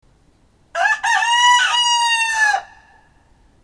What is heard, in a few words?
chicken